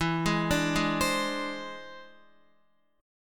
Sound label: E Augmented 7th E down Guitar; E+7 arpeggiated